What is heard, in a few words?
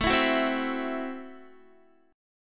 A Minor 6th A Guitar; x,12,14,11,12,11; strummed; Am6